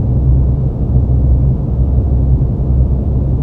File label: sickbay office; trek